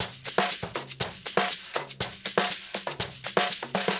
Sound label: 120 beat perc, Nu Jazz House; PowerFX